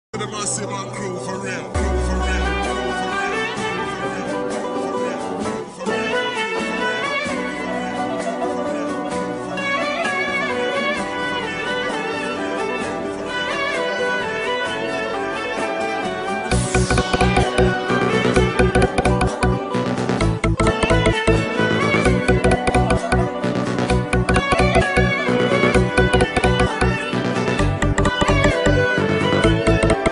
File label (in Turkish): Telefon Zil Sesleri, Super Telefon Zil Sesi Muzigi